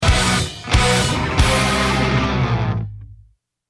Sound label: deathcam